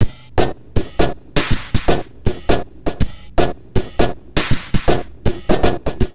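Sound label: neuro, drum loops crazy processed, 80 bpm; USB Soundscan vol.07; AKAI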